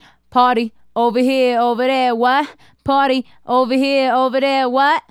Female Solo, Vocal

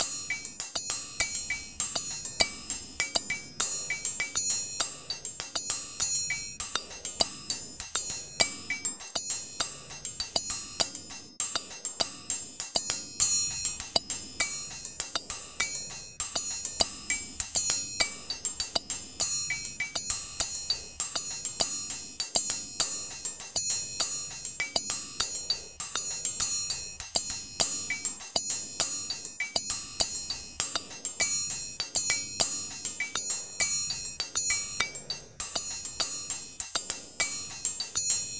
Bellz N Cymbals, 100, Percussion Combos; M-Audio; Pro Sessions VOL-38; Abstract World Fusion 2

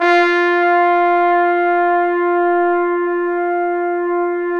BRS FF BON, Bone Sec.1

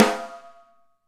rim, Alesis DM5

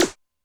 Lo Filter, Alesis D4 drum machine

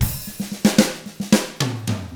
POP ROCK, Drums; ILIO; Double Platinum Drums 1

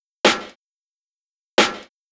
Snare, Snare Patterns; Beat Components

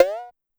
Cowbell 808 Up, Pitch Env; Clavia DDrum-AT; Pitch Env Various

